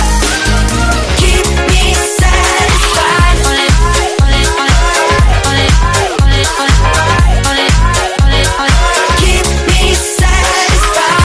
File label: hot electro ringtone